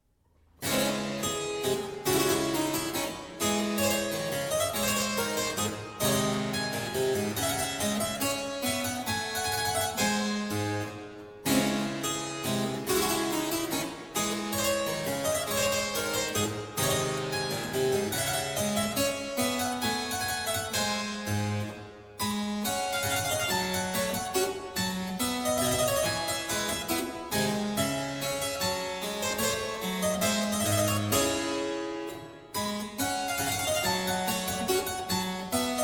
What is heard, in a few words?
menuet; hurlebusch conrad friedrich